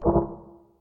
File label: rejection; overte; audio Feedback